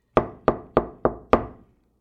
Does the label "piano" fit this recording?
No